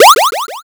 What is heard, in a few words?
jump